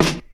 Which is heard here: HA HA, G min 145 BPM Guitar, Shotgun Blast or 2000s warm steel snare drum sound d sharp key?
2000s warm steel snare drum sound d sharp key